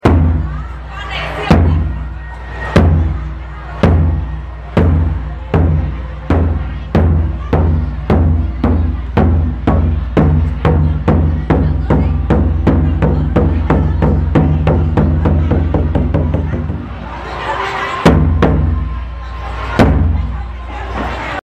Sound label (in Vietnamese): Tieng trong vao lop